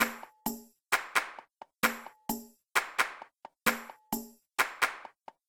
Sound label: music home; Princess Goldblade And The Dangerous Water